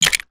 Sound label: brick move; build mode